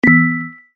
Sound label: dong